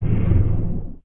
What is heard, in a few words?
Grave Run; Sid Meier's Civilization 4 Complete; Beyond the Sword; Units